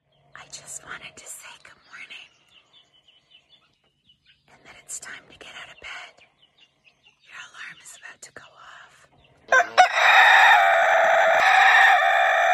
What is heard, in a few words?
rooster wakeup alarm ringtone; android